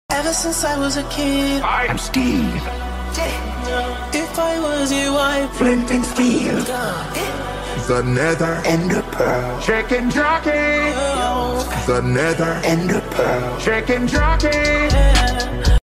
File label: trap song